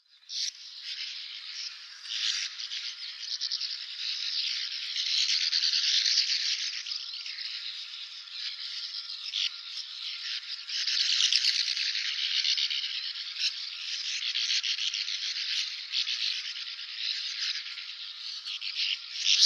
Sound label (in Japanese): syoudoutubame, bird